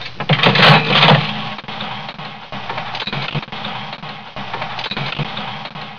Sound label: SLOTS PUL